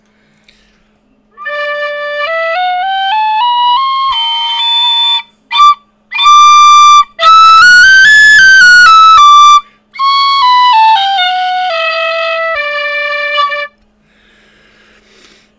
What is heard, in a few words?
squeky